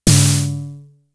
Snare, Drum Machines; Fricke Schlagzwerg

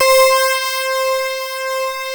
PPG POLY C5, Analog; USB Soundscan vol.49; Analog Fantasies; AKAI

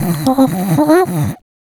VOICE FX, Dance Vocals

